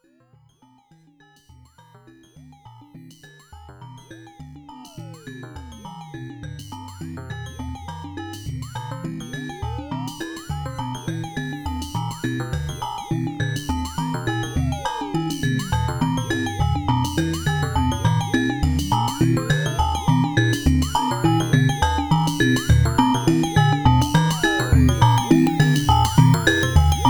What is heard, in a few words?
ARP2600 Orange Noises